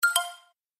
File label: Game Notification; Infernal Radiation